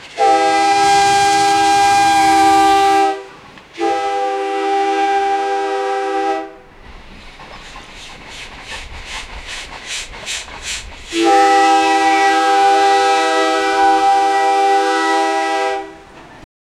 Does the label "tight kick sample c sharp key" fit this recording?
No